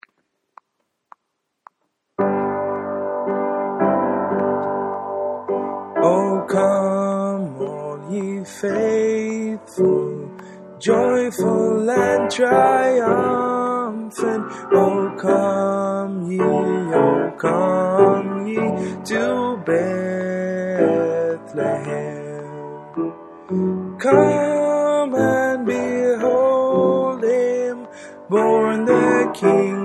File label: o come all ye faithful sop